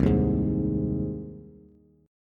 C Minor Sharp 5th C down Bass; strummed; JGuitar

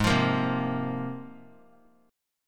Ab 6th Add 9th Ab; strummed; JGuitar

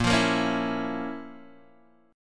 F Major 7th Suspended 4th Sharp 5th Bb

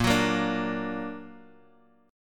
A sharp Augmented, Guitar, DADGAD; 8,9,8,7,x,x

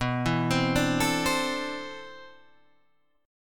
C Major 13th B

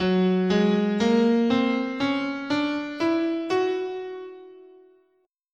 F sharp Lydian Minor, scale

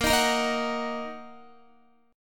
Bb Suspended 4th Sharp 5th, Bb, down, Guitar; Bbsus4#5 strummed; JGuitar

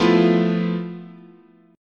F Major 7th Flat 5th, guitar chord

